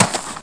stone; Grounch

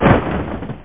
TANK DIE; UFO Enemy Unknown CD32; Tactical Fx

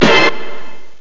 orch hit4, strings; Amiga 8-bit Sampled Voice; Octamed 6

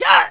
npc attack; specops

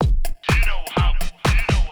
drum loop house 125; eJay

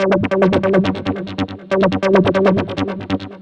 synth; widdagroovebox

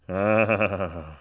ahhh; Wired for Sound Pro CD; Aristosoft, Inc.; homer

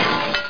GLASS

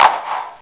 COOL CLAP; Groovy Bytes: Behind the Moon; BAS PERC

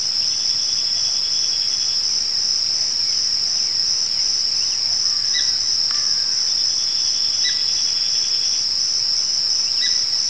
tire rise

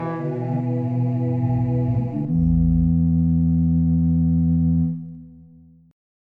lev over

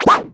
Move; breakout

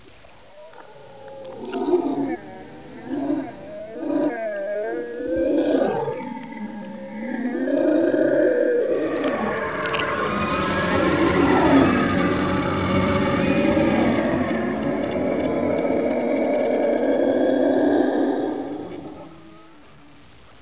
background; ambience; atmosphere

